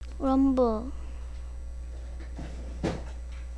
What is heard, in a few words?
rumble